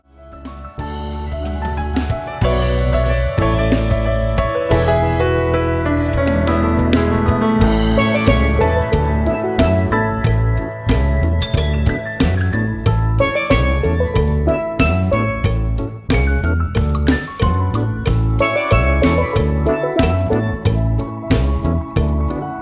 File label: Sandbar Reggae